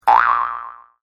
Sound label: boing